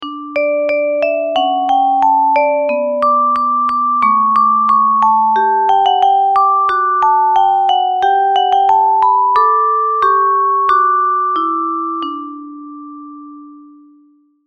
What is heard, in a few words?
ringtone